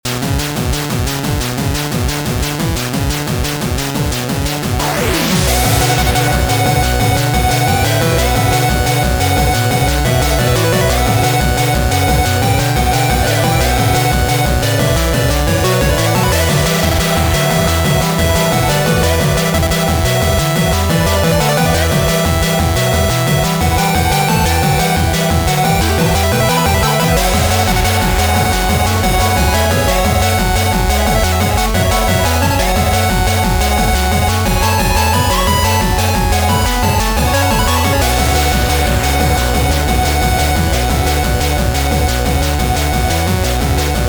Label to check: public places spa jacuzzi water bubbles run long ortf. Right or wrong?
Wrong